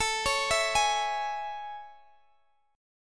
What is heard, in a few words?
A Major 7th A, Guitar, DADGAD